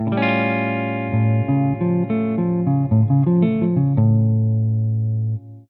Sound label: Arp; theorie; scales